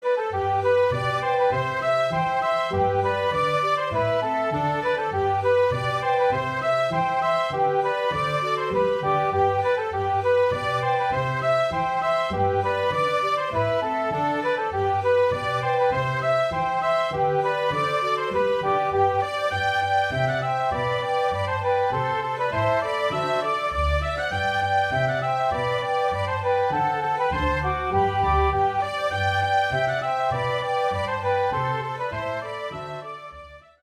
Dorset 4 hand Reel

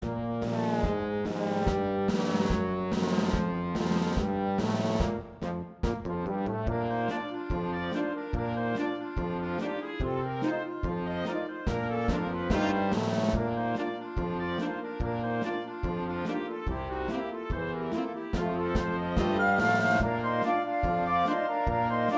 Mini Ragtime

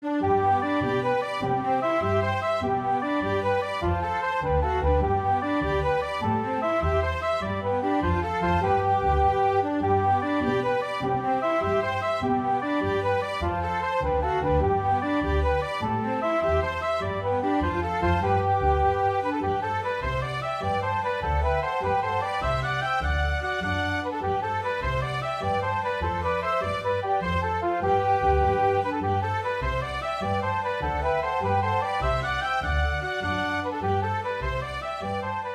48 bar Jigs

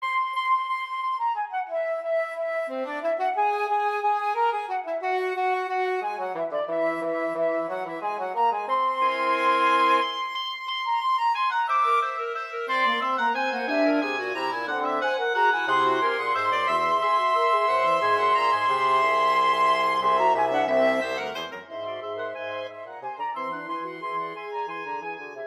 Allegro vivo

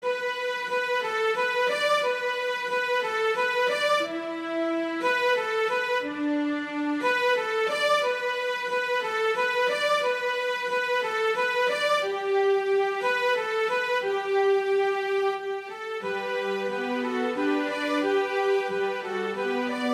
Air Violin Trio

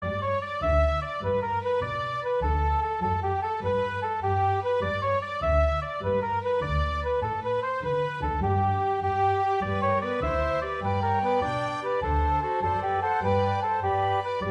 48 bar Jigs

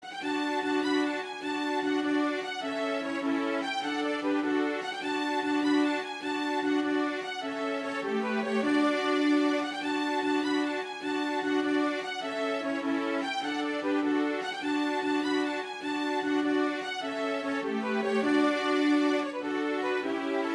Jigs Violin Trio; Cottagers

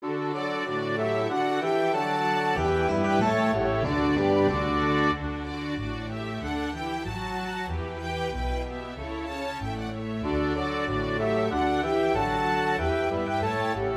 Folk Dance Sequence

